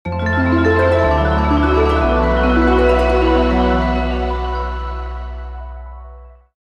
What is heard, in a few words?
Shortcut Pipe Unlocked; paper mario the thousand year door